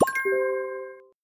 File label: right answer effect